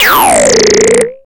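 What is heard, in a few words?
FX right, attacks; Best Service Advanced Media Trax Modern Composer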